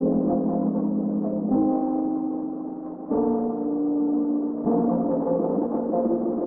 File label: piano somber notes, sad loop; Loops